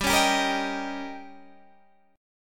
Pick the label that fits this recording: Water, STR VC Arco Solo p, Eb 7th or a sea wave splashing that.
Eb 7th